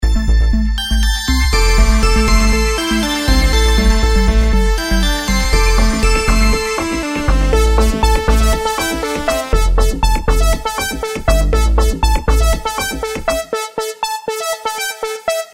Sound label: hero ringtone